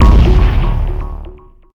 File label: explode; redeclipse; weapons